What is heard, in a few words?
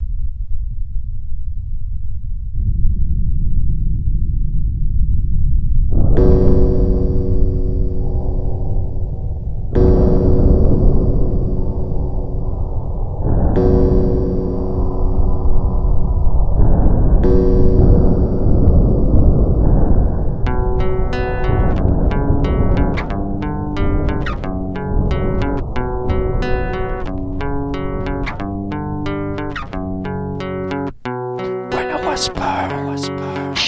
Accostic Version